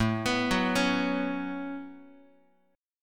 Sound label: A 6th Add 9th A down Guitar; A6add9; arpeggiated